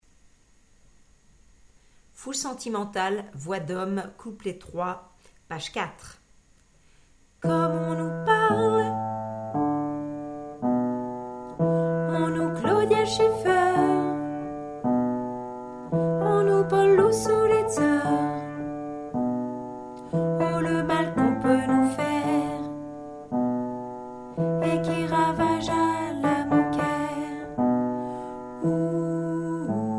hommes; couplet 3

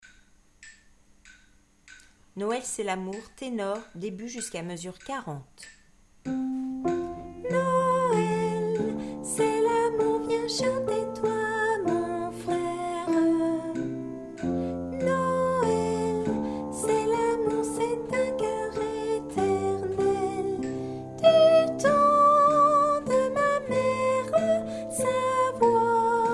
tenor

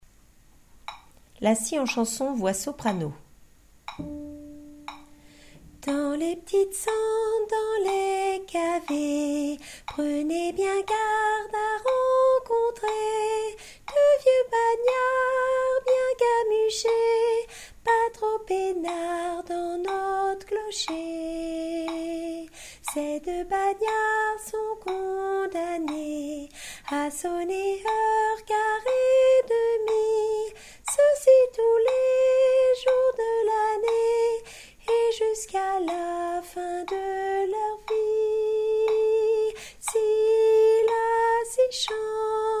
soprano

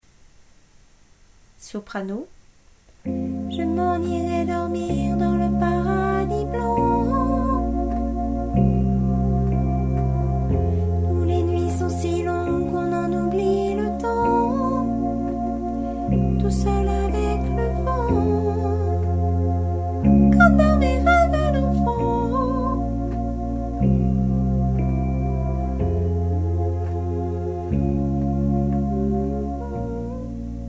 soprano

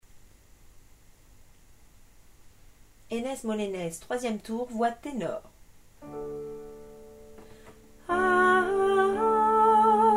tenor

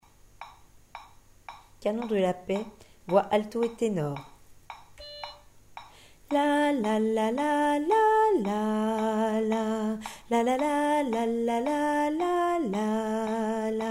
canon de la paix alto

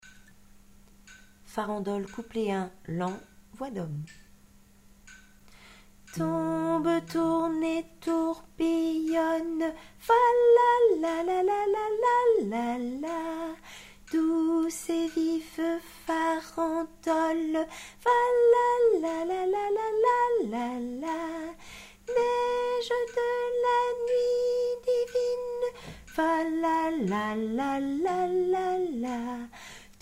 farandole lent hommes

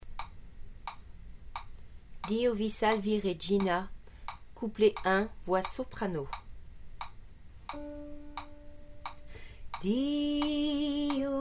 soprano

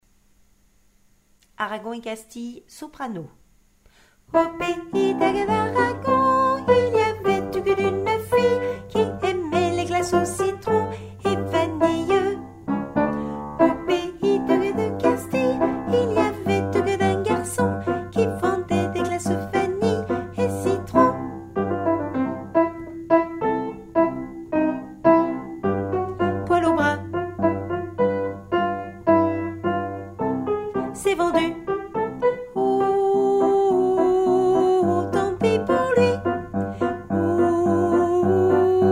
soprano

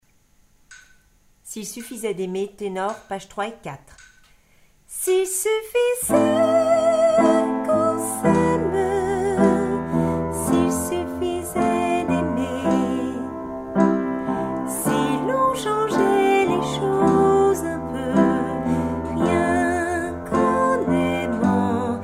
tenor

